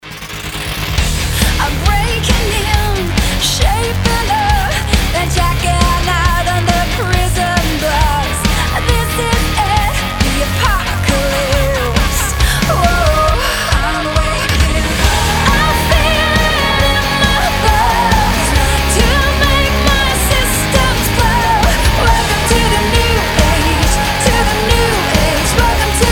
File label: rock version